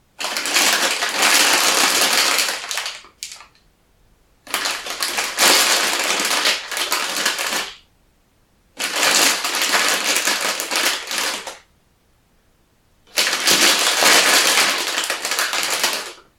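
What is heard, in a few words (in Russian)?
пластиковый пакет мятый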